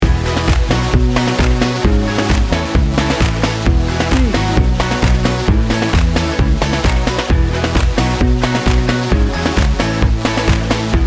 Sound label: party loop